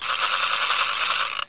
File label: spin; Ghandi